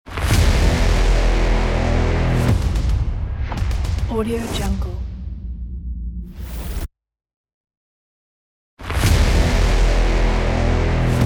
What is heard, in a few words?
opener cinematic action movie